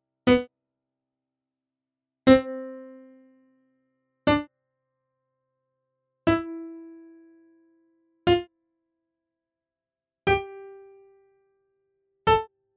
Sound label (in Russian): Obertony royalya